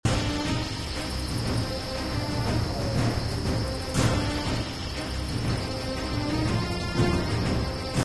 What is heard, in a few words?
Loop with Ambience; Temple Run: Brave; Android, iOS; 2ndChance